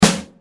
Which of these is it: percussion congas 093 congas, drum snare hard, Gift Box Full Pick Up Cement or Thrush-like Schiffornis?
drum snare hard